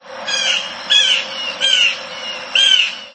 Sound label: Cyanocitta cristata; Chordata; LandBirds